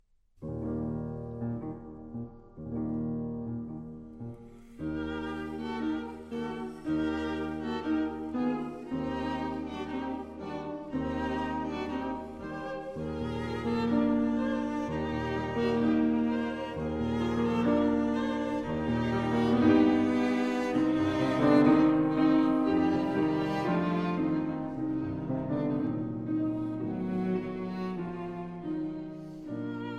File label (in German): Klaviertrio c-moll, Andante; Dvorak